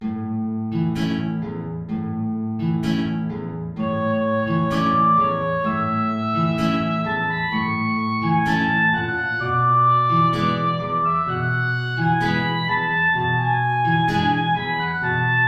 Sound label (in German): Tango (Oboe & Gitarre); Opus 165-2